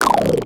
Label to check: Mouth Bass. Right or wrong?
Right